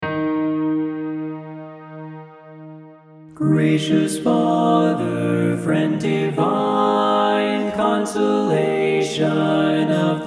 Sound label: ALTO